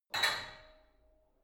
barbell only lift up reverberant hard, fitnessstudio; sport freizeit